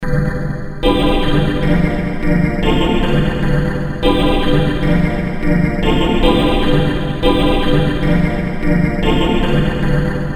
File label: Low On Space, pads; Industrial